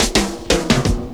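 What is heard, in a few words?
drum roll; E-Lab; X Static Goldmine 2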